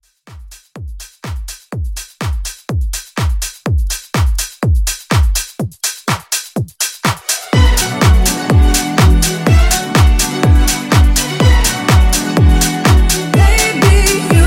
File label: Future House Edit; Remixfreakz